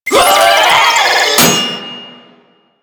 Proto Infested Volatile Runner Explosion Warning; Enemies; Abilities; Warframe

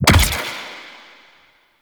Eye Grenade Launch; Ambience; Gameplay; Warframe; Orokin Moon

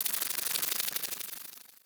Ext Critter Scatter Clicky; Warframe; Infested Eris

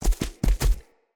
Stag Gallop Staggered Run Vegetation, Footsteps; Animals; Foley

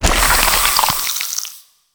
Gain Ink Stacks; Gameplay; Warframe; Shadowgrapher

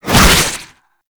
Whip Whoosh; melee; weapons; Oraxia

